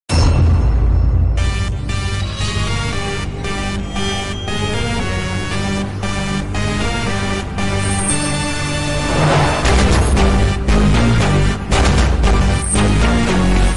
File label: Background Music; Nene Raju Nene Mantri